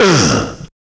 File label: HUM; AMG - Now CD-ROM (Roland); Art of Samplng